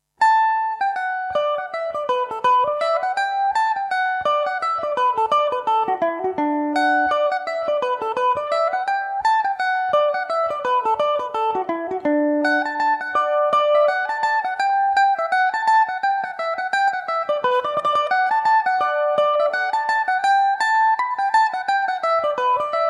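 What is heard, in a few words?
D major revelation, Irish mandolin; boys of ballinahinch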